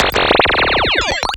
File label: Laser Fast